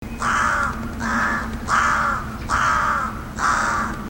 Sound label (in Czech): havran polni corvus frugilegus